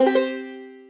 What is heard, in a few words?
PUZZLE Success Banjo Three Note Wet; stereo; Universal Sound FX